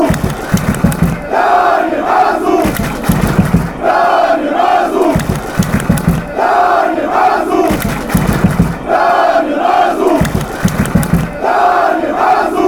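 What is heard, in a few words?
fanchants, football chants; daniel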